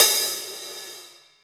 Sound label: open, Drums Percussion; Drum Bass; Zero-G; Total Drum Bass